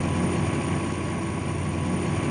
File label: elevator loop